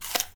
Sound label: Apple Crunch